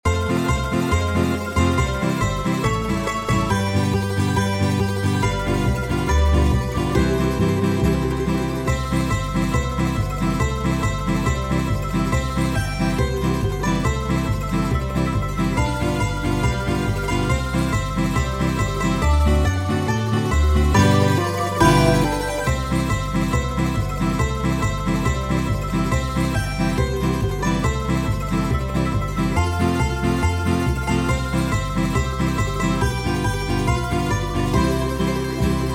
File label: Jingle Bells; Musicondalla